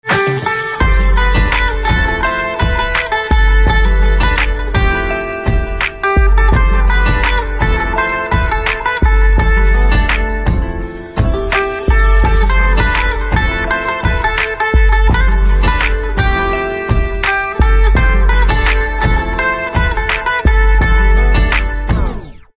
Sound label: Sitar Instrumental Ringtone; sitar and veena